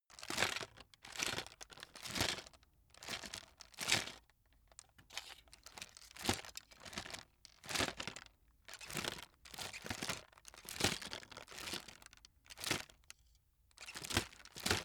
chocolate box handling

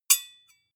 sword hit scrape soft

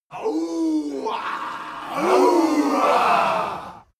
native american shout

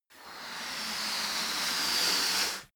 balloon blow swell

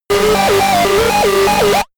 game arcade race tension

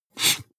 marker mark hard short

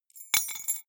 key ring small drop stone soft

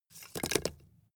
wood logs small fall

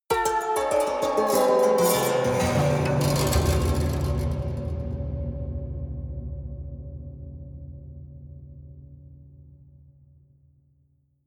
haunted strings down stroke slow hard

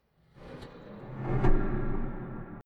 small impacts

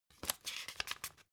old pick page

